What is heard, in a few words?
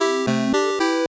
8bit se